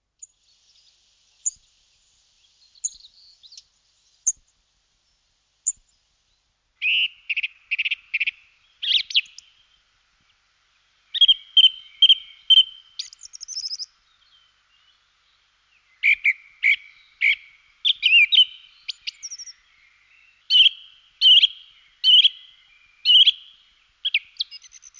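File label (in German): singdrossel, Vögel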